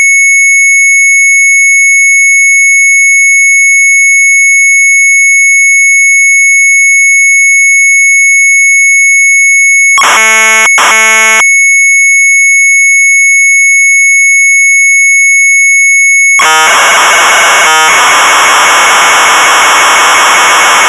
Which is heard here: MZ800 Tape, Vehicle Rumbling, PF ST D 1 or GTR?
MZ800 Tape